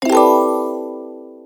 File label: Picture Reveal; Levels Hub Orleans; Draw Wallpaint; Picture Reveal Player